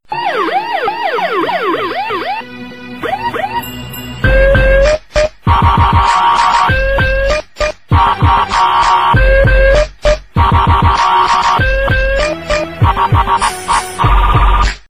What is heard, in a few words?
siren